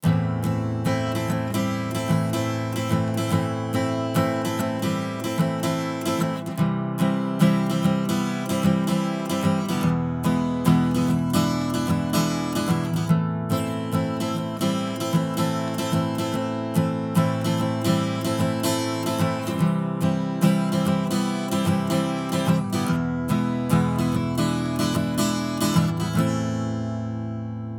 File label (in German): Gitarre EQ Tube; Gyraf Audio G14-S